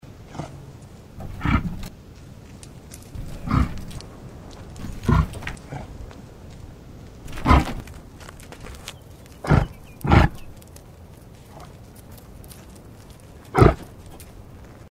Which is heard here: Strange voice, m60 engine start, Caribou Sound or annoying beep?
Caribou Sound